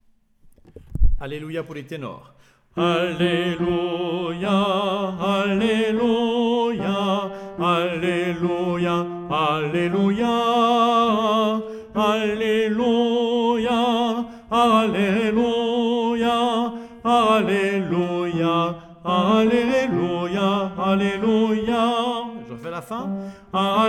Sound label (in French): Chants Alleluia T